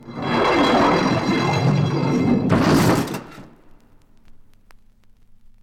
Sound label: portcullis closing